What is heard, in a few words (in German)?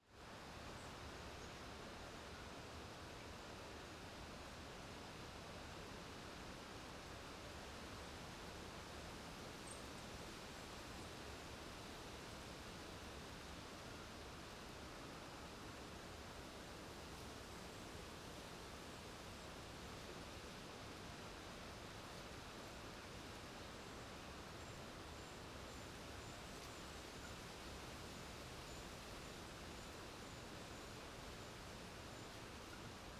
waldesrauschen und windesrauschen; geraeusche digital